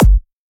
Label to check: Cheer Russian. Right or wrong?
Wrong